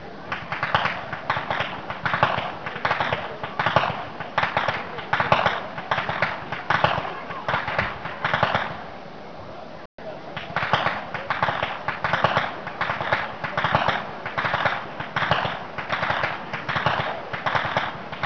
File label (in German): schnalzen, jugend; Muntigl